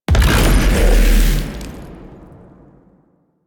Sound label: Bullet Orb Explosion Large; Warframe; Zariman; Void Angel; Abilities